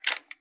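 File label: gear rattle weapon medium; viper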